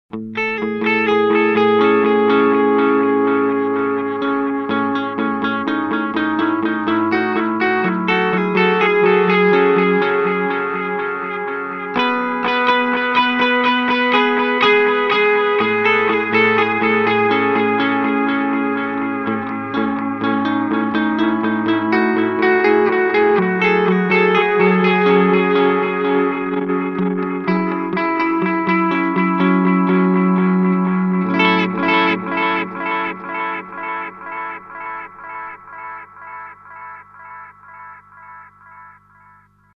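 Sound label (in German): Tube Tape Echo hoehenreicher und schmutziger; Fulltone TTE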